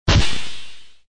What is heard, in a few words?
Hit